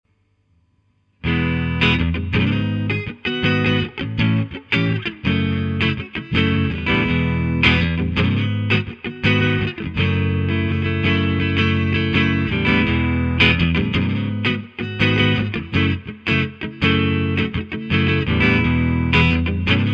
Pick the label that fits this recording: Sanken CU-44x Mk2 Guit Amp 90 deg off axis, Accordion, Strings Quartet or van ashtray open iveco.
Sanken CU-44x Mk2 Guit Amp 90 deg off axis